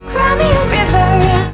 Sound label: cry; torchlight